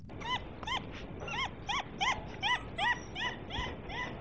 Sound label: Cuon alpinus yak; Dhole; Calls during friendly interactions in a pack